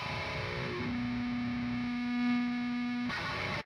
death guitar; smite; chaac

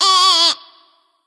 nv tongue; smite; voicepack; ratatoskr

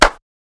Victory Clap; smite; kumbhakarna

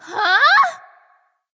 confused; smite; artio

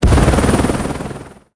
helicopter; Smite; Zhong Kui; commando

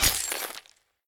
sword stab ground; smite; Ares

